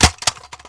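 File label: grass; Mafie 1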